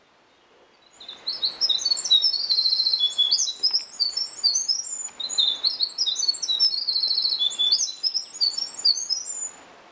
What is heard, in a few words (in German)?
Zaunkönig Troglodytes hiemalis; HENRIK